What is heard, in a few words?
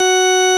VOX F#4; Sonic Reality; Vintage Timetraveler; VOXCONTORGAN